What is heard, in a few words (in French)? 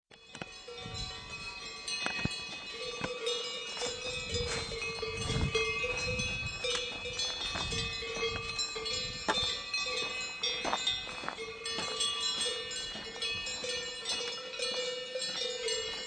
Polyphonie clochettes Field recordings